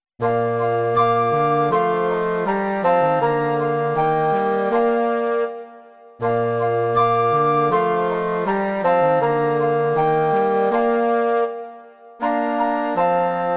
2 flétny, fagot a tuba; Bože velký, jehož zákon svatý; Imaginativní verze

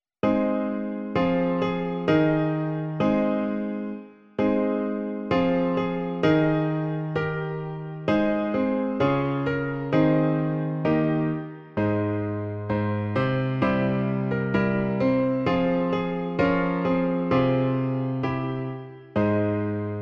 klavír; Klavírní verze; V ruce Tvé, Pane

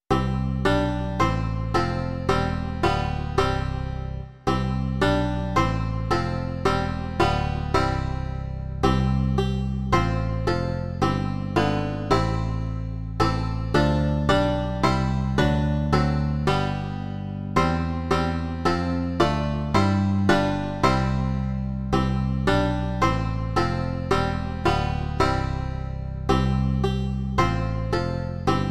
banjo a baskytara; Pane Bože v nebesích; Imaginativní verze

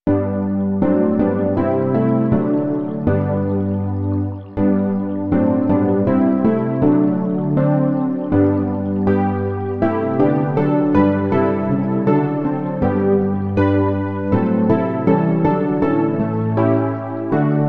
el. piano; Jest noc; Imaginativní verze

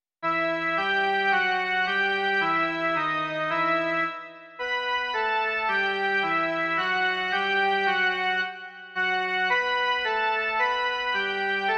jen melodie; Beránku Boží, Kriste

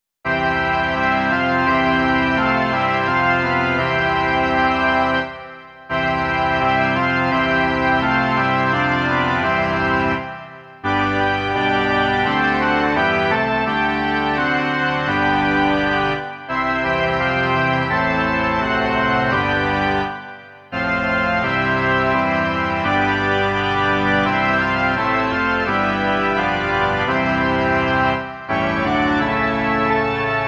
varhany; Ejhle, Hospodin přijde

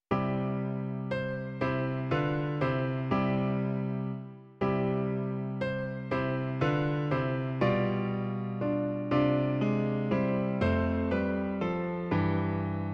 klavír; Bůh, jenž láska; Klavírní verze

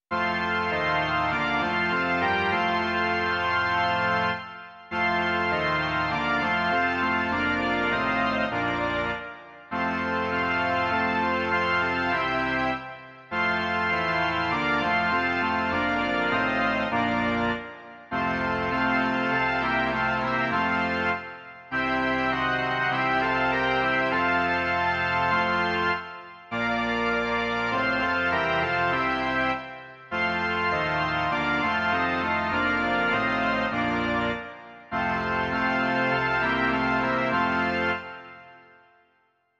varhany; Varhanní verze; Ó jak věrný přítel Ježíš

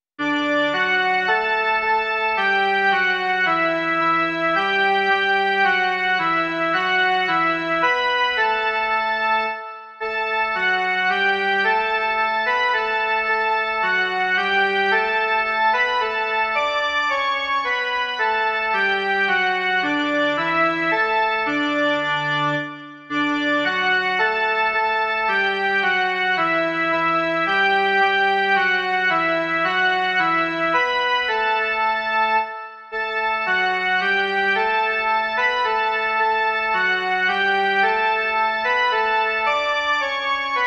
jen melodie; Ó Bože, vyslyš nás

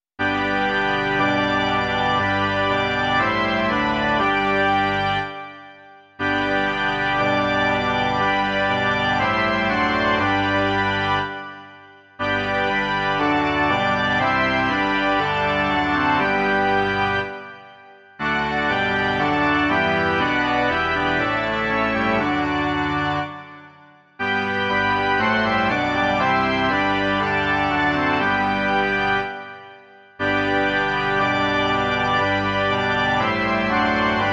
varhany; Bože, jenž jsi Krista nám dal

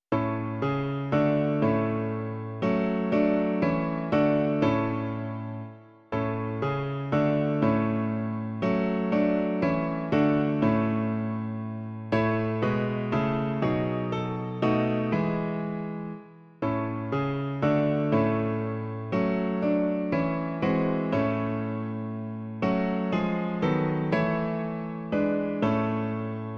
klavír; Klavírní verze

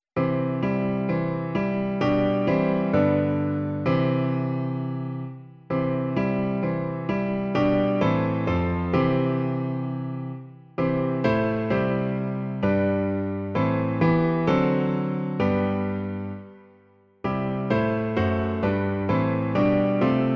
Velký Bože, Stvořiteli - klavír; Klavírní verze

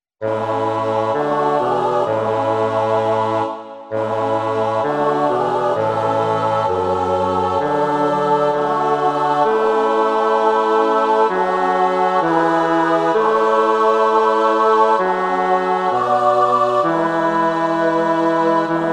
pěvecký sbor a fagot; Zpívejte Bohu píseň svou; Imaginativní verze

